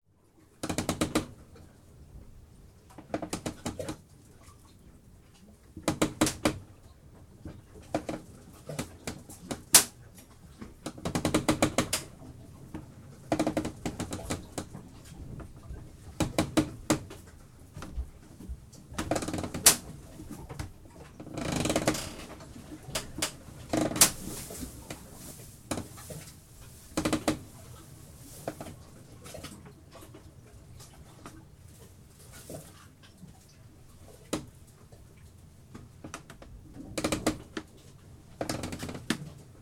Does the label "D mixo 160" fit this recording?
No